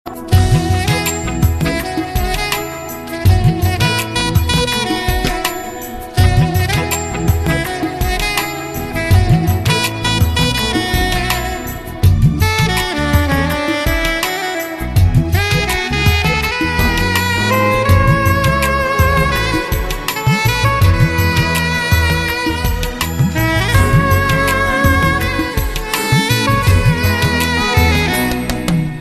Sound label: Music